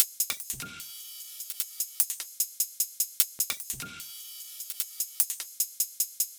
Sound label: Loop 150 BPM